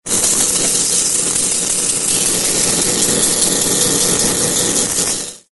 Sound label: rainstick